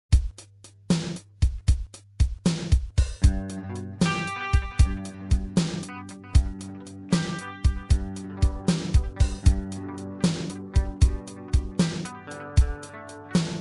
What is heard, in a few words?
digitech pro